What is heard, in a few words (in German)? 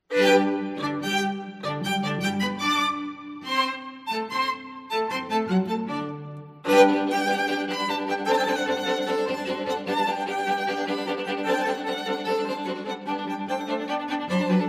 Klassik Nachtmusik